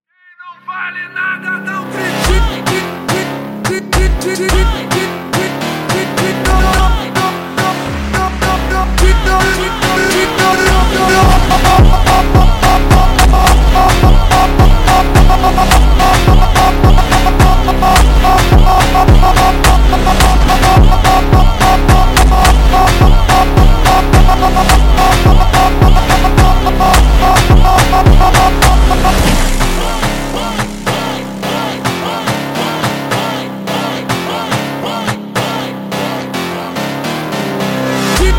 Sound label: Funk slowed Down